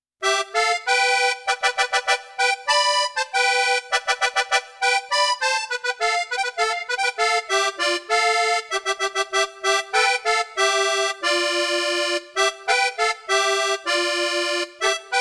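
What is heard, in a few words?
musette accordion; ax-09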